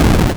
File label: dirt